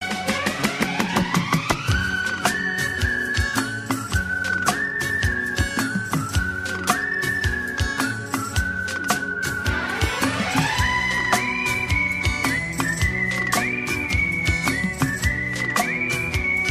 Whistle; Barsaat

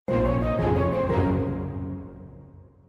Secret Area; XC2

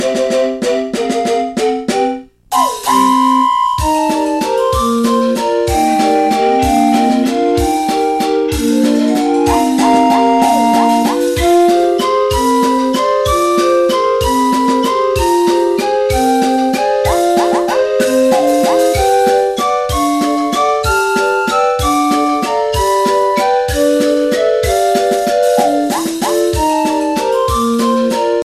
Toy Train Theme

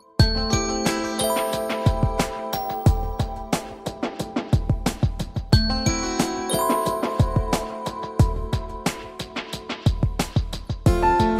Cool Tone

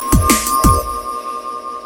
B-ling

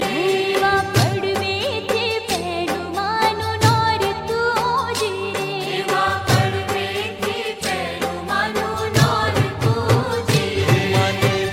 Garba; Ashapura

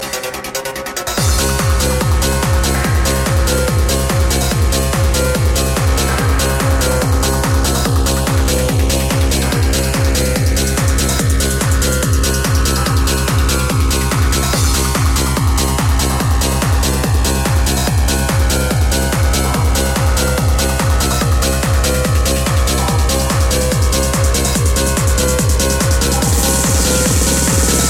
Super Duper Techno

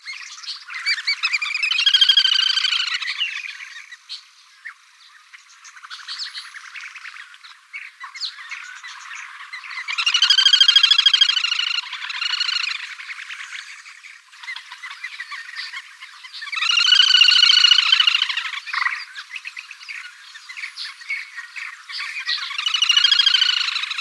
Little Grebe

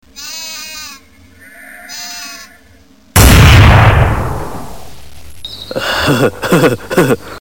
Sheep

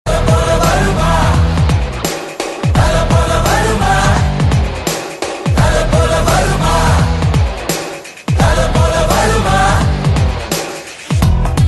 Rocking Mus

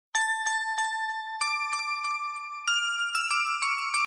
Melody

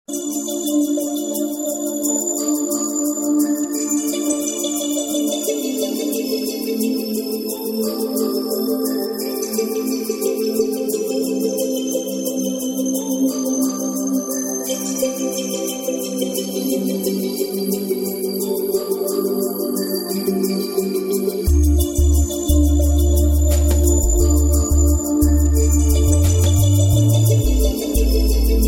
Ambient Ring

